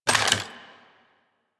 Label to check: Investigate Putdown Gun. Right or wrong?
Right